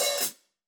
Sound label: Hats Open, drum